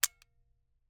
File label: Camera, Aimex SP500, point n shoot, plastic, snapshot; Eiravaein Works; Vaeyan IV